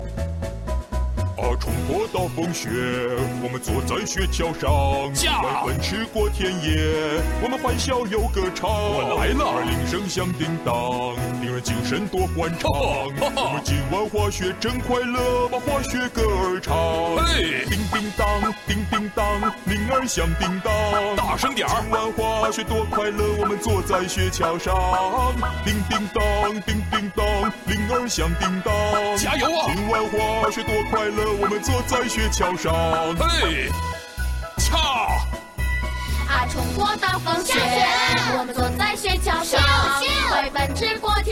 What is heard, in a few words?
Sounds of Christmas Bells